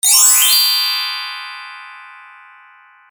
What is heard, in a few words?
sfx up